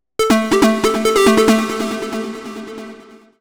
TECHNO EXTRA 140

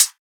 hat; Anthemic

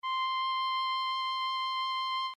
Oboe; Real Samples; oboe&horh

